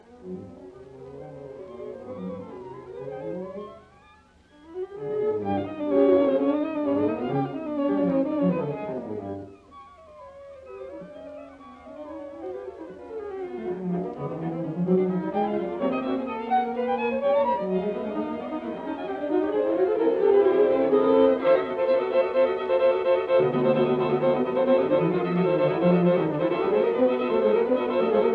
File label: String Quartet No 5 BB 110 V Finale Allegro vivace; Bartok